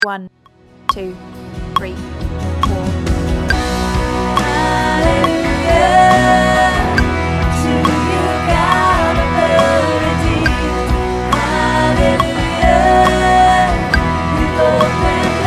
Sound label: alto